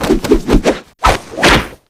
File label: mantra; nahyuta